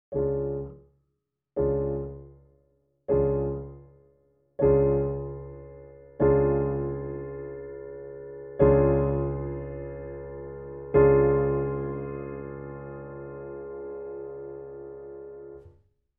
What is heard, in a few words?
partial pedal, piano